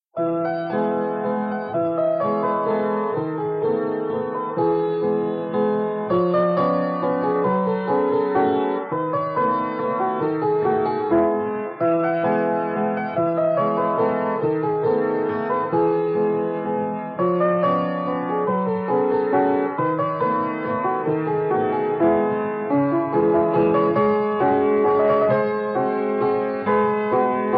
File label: waltz